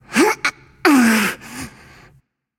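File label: nonverbal grunt lift; smite; voicepack; izanami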